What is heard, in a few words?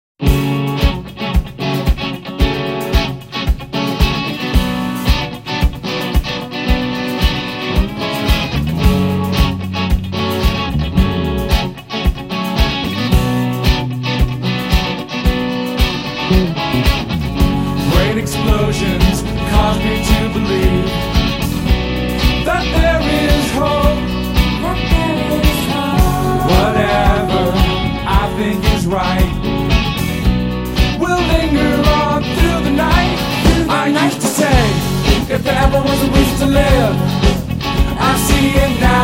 Funky Train